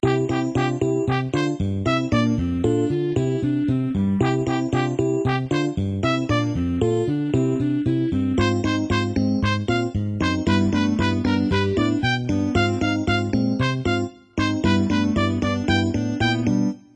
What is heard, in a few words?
BGM Entrance